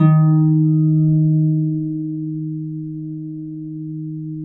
EB2 HARP; USB Soundscan vol.62; AKAI

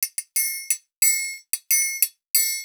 90, Percussion Loops

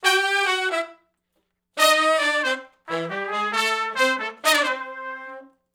R&B Riff (A) unison, Horncraft; Sony Creative Software; Crimson Blue and Fabulous Horncraft 4 RnB; Horncraft for R&B; Sections

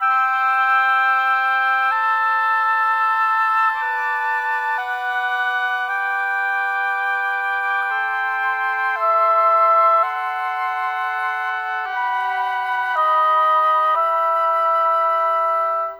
Flute Oboe, Cinematic; Sonic Foundry (Sony Creative Software); Orchestral Series VOL-3; Cinematic 27

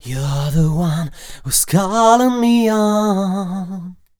m 128 e-flat, Vocals

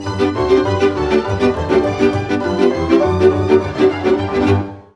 VIOLA BREAKS; Best Service; World Colours

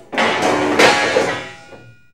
PAN DROP; SFX; E-MU Producer Series Vol. 2; More Studio Essentials

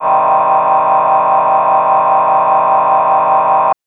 Synth VoiceAh min c2; TrashLibrary

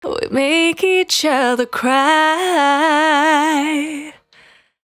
Cry 68bpm B, Vocal; Zero-G

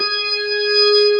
B3 G#4, organ; AKAI S-Series CD-ROM Sound Library VOL-1

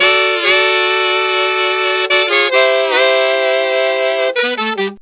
Sax Section in G, Jazz; Traditional; Sonic Foundry (Sony Creative Software); Jazz Solos and Sections

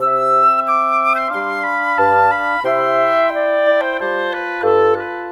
Brass Winds; Sonic Foundry (Sony Creative Software); Orchestral Series VOL-3; Cinematic 24

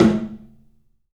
TOM, 3D Percussives

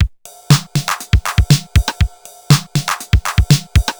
TR 505 (120BPM), beats; musicradar; cyborg beats

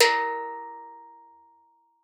STEELDRUM A#3; Xsample VOL-4

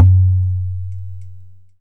GE, Tabla; Mango Loops

